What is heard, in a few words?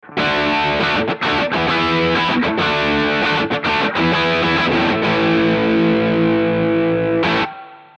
Extinct Audio BM9 Crunch E Guitar 13cm 100Hz 24dB Oct HPF